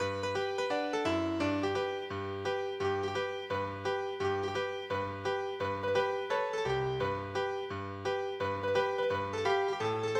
Gointo Shout All Over Gods Heabn Rag Time

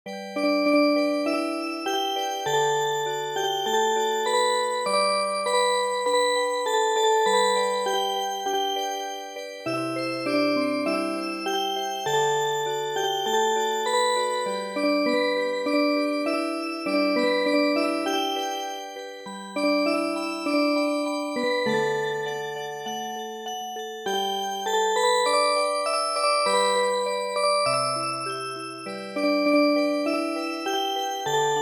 Music Box, midi

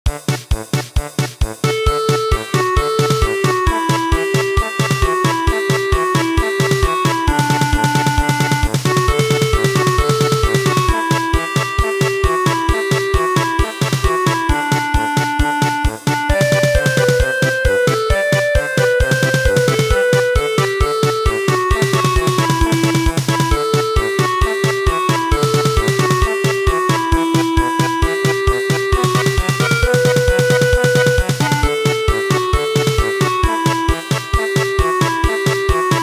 Circassian mountaineer song

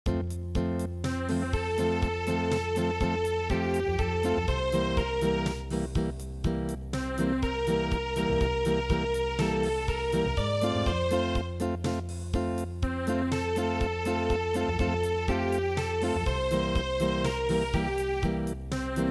Acoustic; Heidschi Bumbeidschi

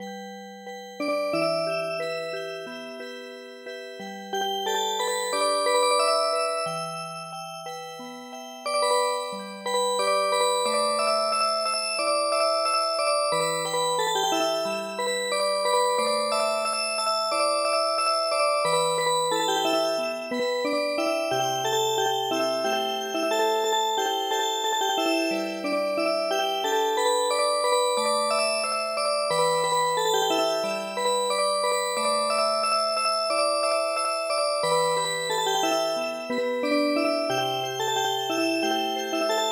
yagibushi Music Box

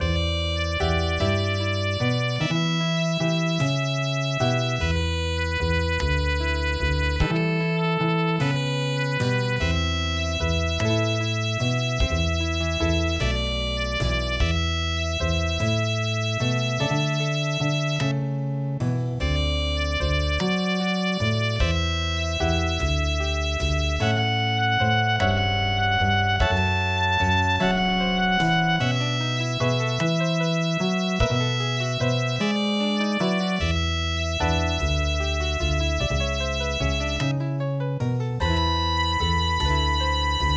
blues, midi

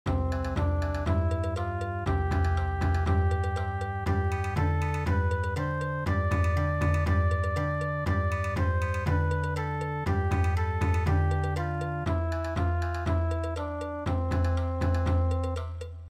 Bon Odori

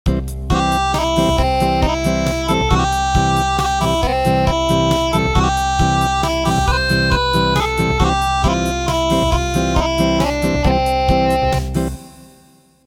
Acoustic; Umupo Po Kayo

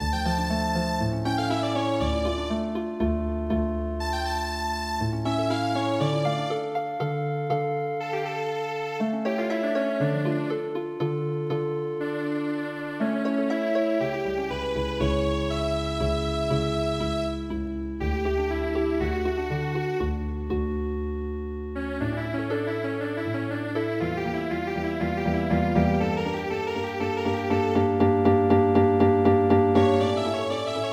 Toccata und Fuge in d Moll, Healing Harp

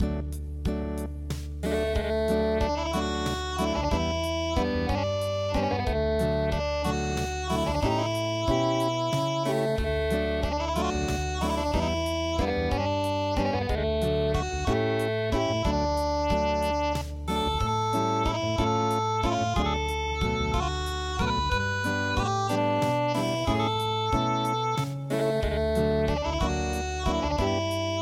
Acoustic; Hamabe No Uta

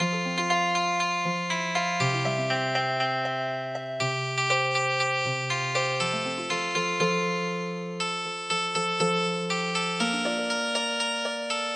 Healing Harp

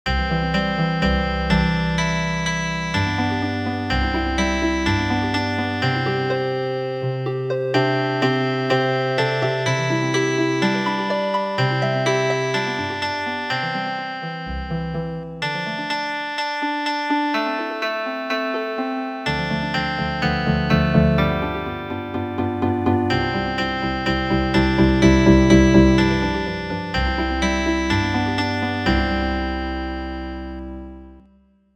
Healing Harp

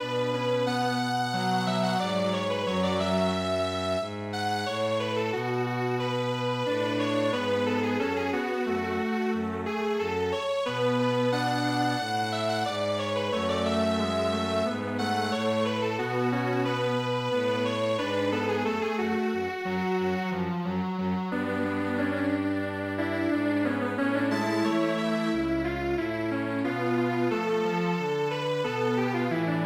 Strings Quartet

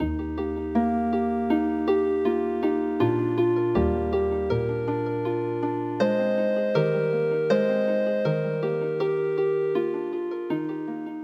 harp; katatataki

